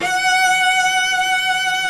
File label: SOLO CELLO